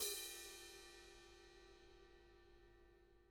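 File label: cymbal brush soft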